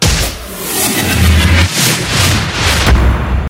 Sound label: sci fi explosion